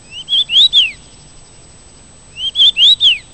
Carpodacus erythrinus

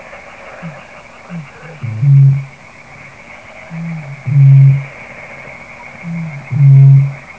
Botaurus stellaris

